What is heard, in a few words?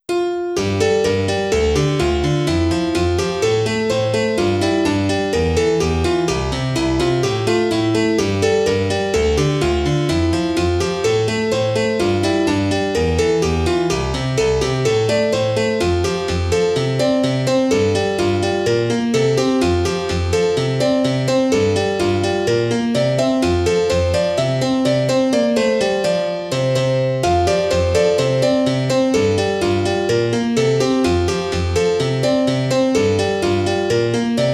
Flying Petal, PIANO